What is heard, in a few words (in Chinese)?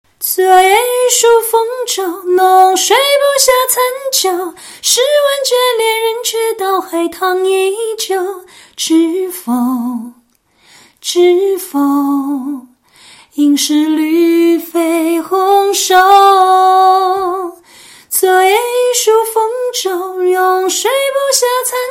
清唱